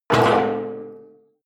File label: metal impact can large rattle hard